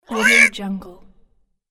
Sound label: furious bird groan